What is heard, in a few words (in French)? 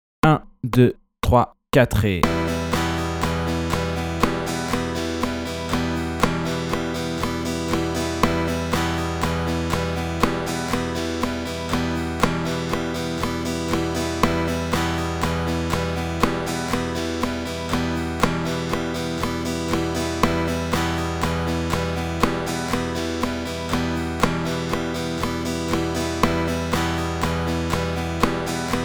Flèches vers le bas et le haut